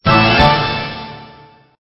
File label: Stinger; STINGERS